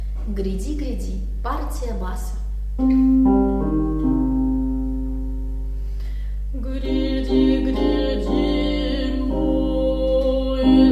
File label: bas; epiphany; gryadi gryadi